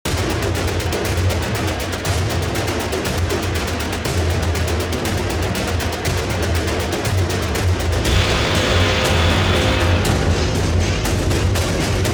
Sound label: Combat Rythm; Excubitor